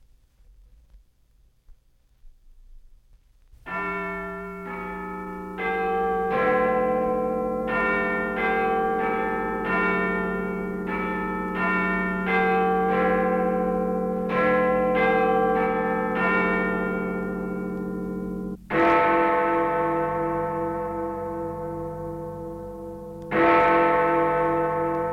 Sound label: Big Ben Strikes Twelve; Jac Holzman; Authentic Sound Effects Volume 2